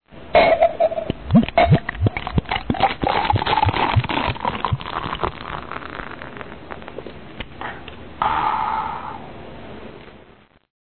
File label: beer noise